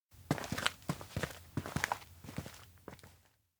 steps indoor community walk; koyomi; anemoi パッケージ版 1.1

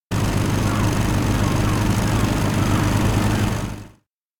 elec plane inflight indoor; anemoi

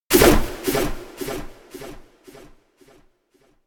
elec craft fly; anemoi

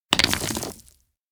stone crack; anemoi

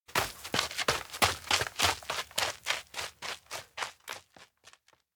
steps outdoor ground hurry; rikka; anemoi

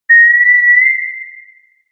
elec blow ocarina sultry; anemoi

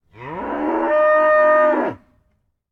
cow voice; anemoi

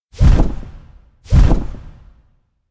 elec disasterbeast wings chase; anemoi

